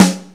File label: smooth bottom end snare drum sound f sharp key